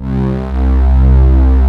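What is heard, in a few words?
ANALOG PADS; Pro-Rec - Synthsonic; TRINITY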